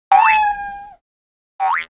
cat action

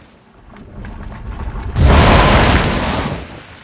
Bomb Main Skill

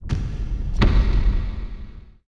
Die; Seer; GamigoNA

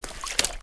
Mad Slug Run; Seer; GamigoNA

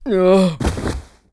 Mandragora Die; Seer; GamigoNA

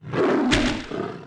Boar Die

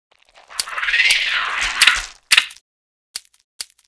Scorpion Action; Seer; GamigoNA

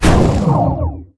Shining Purge; Gamigo

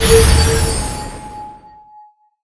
Explosion Nova; Qickskil

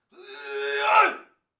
master attack

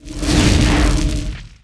triple hit

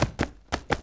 Unicorn Walk

